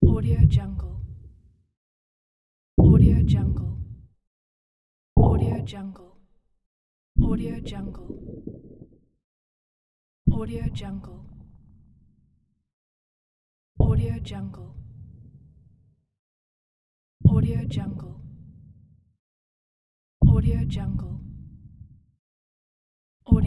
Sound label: underwater weapon sound effects